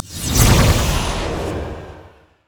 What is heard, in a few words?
detonate; LoL; varus